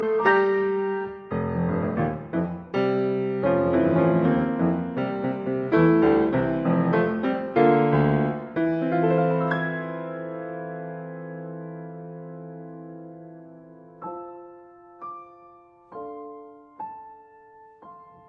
Piano Sonata No 3 Sonata Brahmsiana III Recitative